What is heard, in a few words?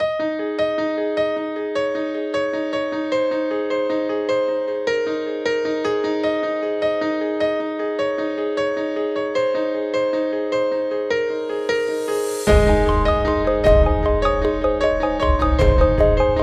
Corporate World; آهنگ های بی کلام برای تیزر انتخاباتی